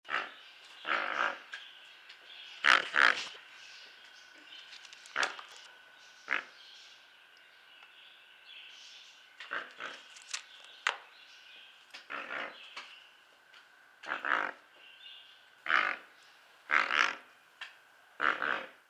white pelican; emiquon virtual tour; birdwatching; illinois

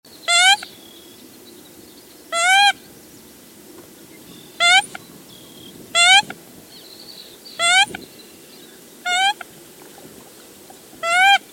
american coot; emiquon virtual tour; birdwatching; illinois

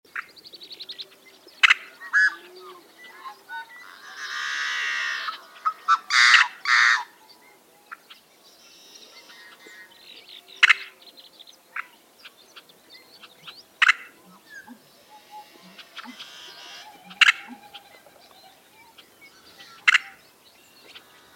yellow headed blackbird; emiquon virtual tour; birdwatching; illinois

